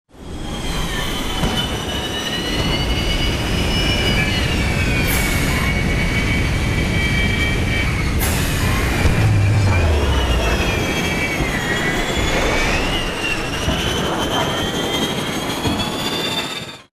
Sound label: flyer dropshipattack; crow; Titanfall 2